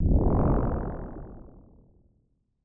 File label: cartoon space ship fly away